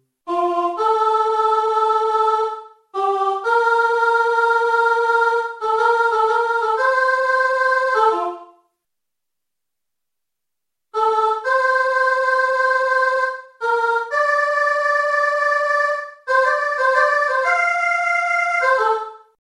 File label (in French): soprano refrain; Chanson française